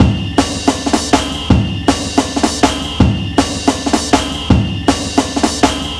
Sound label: 160, Drum Loops; Total Drum&Bass